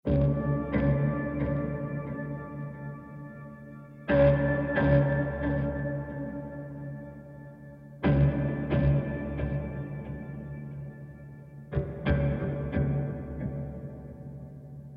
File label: Lap Steel Rhythmic; ML Lap Steel Rhythmic Mood 14